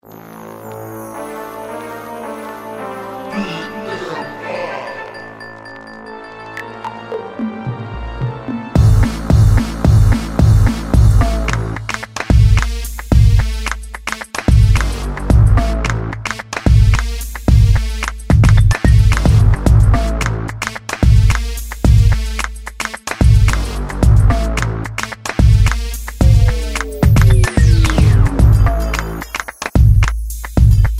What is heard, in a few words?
minimal bounce